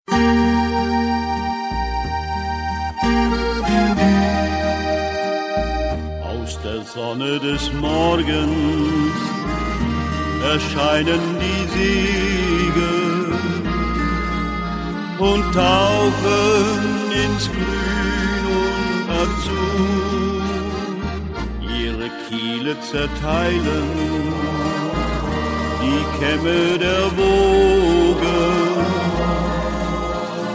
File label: windjammer, shanty chor